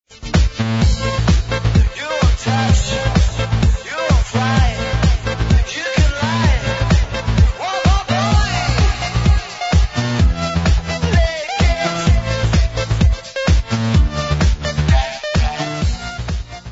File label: snare tom; universal music mobile